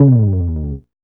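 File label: BASSLD, bass lead; E-Lab; X Static Goldmine 1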